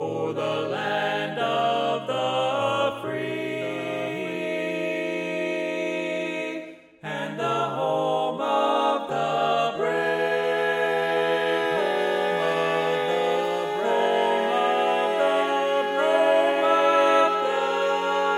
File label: Lead